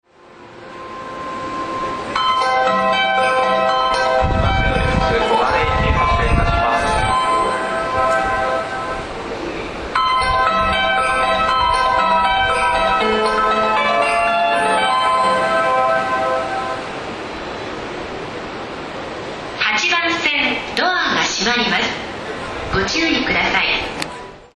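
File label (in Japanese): 発車メロディ, 大崎駅, 埼京線・湘南新宿ライン; 湘南新宿ライン・りんかい線; 新木場・横浜方面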